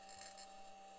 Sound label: mic, mars2020 edlcam